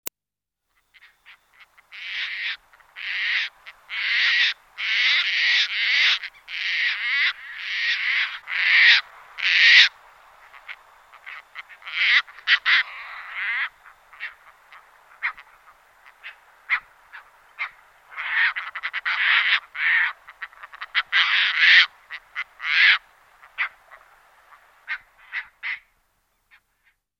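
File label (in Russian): chegrava golos, Caspian tern voice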